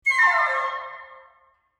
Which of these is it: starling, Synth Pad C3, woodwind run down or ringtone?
woodwind run down